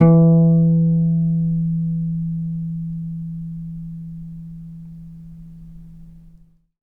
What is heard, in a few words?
winger, Notes, Guitar